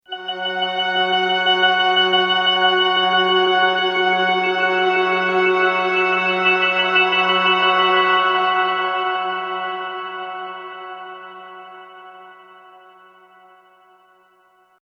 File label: UFO Pad, Pads